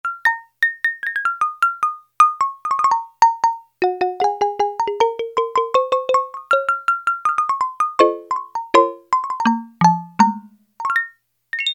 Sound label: XYLOPHONE, Yamaha DX7